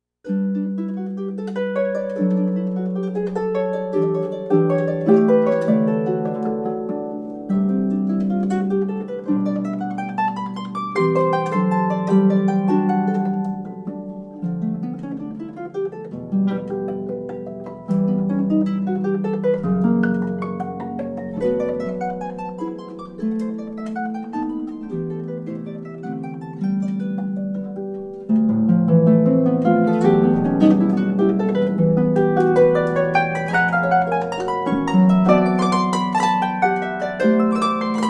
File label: Scherzando, harp; Salzedos Variations on a theme in the ancient style